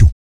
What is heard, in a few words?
VOC PERC, Vocal Planet Jazz FX BASS PERC